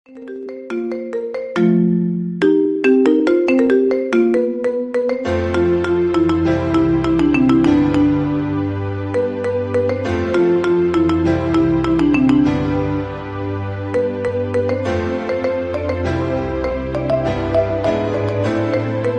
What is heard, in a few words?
Remix Marimba Ringtone